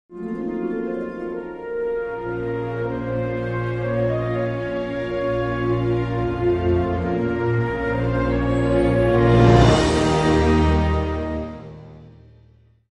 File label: ambience light side; Knights of the Old Republic II-The Sith Lords